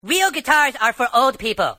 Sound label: Real Guitars; SouthPark